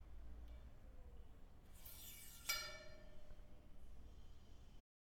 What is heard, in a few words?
Metal Pole Slide